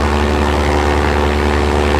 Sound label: prop Sound; Parts Pack